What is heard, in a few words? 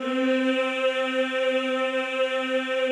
CHO Yhh, Roland; Sound & Vision - Gigapack I CD 2 (Roland)